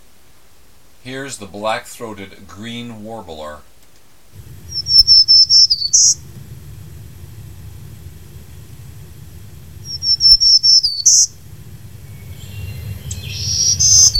black throated green warbler; birds; birds-and-frogs

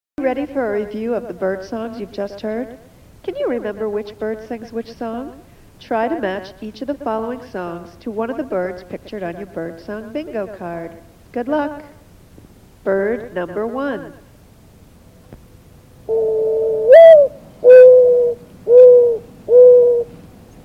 six more birds quiz; birds-and-frogs

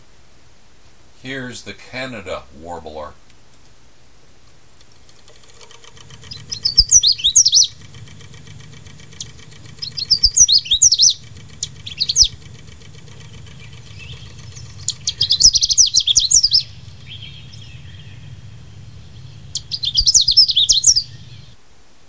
canada warbler; birds; birds-and-frogs

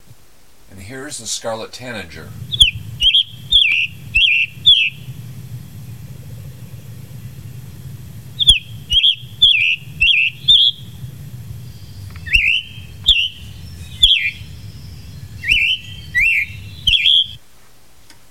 scarlet tanager; birds; birds-and-frogs

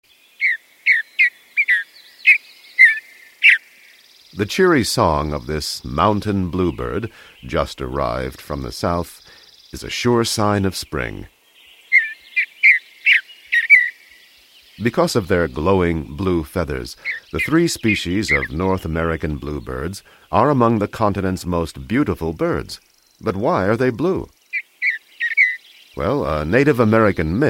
bluebirds, birds; birds-and-frogs

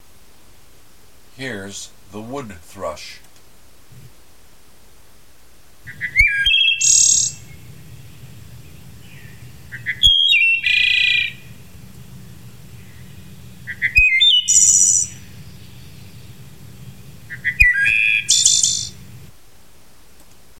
wood thrush; birds; birds-and-frogs

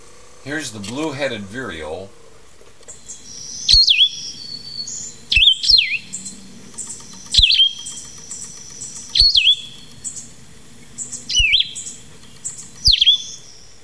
blue headed vireo, birds; birds-and-frogs

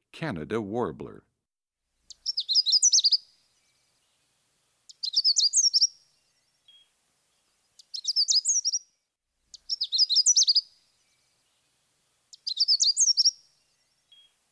canada warbler, birds; birds-and-frogs

